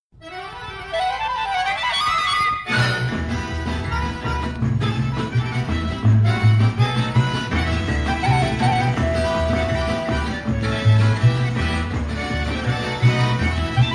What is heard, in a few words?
harmonica; Harmonika